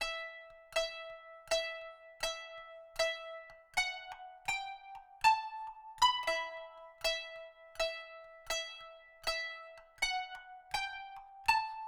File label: kanun